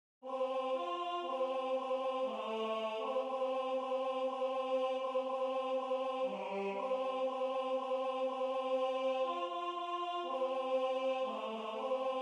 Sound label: Cantate Domino Tenor